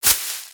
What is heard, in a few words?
Sunflower Seed Toss; weapons